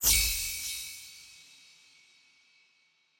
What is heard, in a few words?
Hi freq Light Woosh